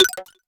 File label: U220 FX Set, Drum Machines; Roland U220